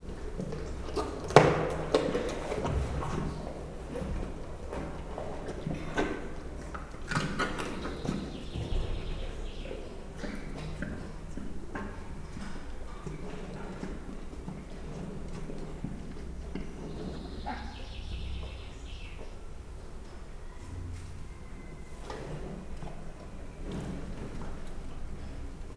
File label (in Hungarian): esznek az oroszlánok; szegedi zoo 2011